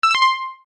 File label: UI Back, User interface feedback sounds; Ui Set J; Computers; Electronics